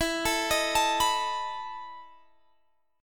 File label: E Major 7th Suspended 4th E down Guitar Standard; EM7sus4; arpeggiated; capo=2